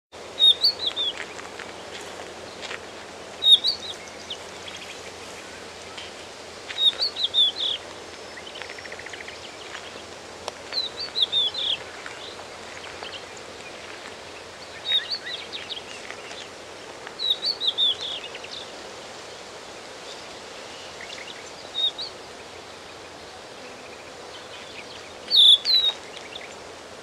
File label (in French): cochevis huppé chant Galerida cristata bruit